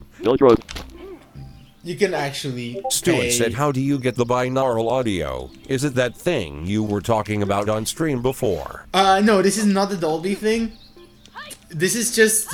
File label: fist pumping